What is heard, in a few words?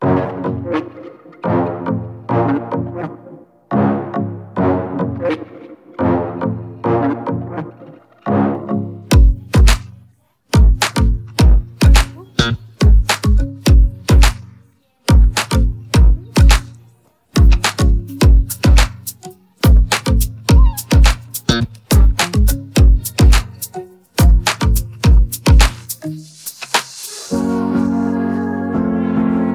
instrumental